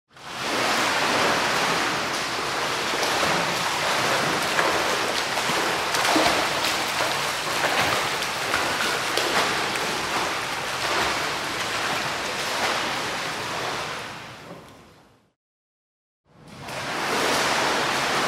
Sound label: Man swims in the pool